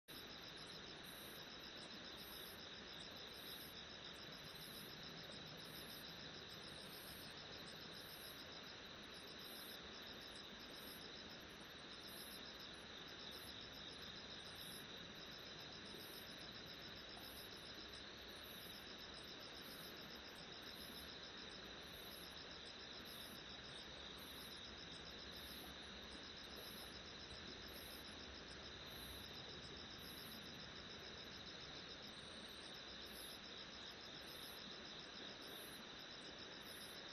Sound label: riverside; Kyoto U; Ashiu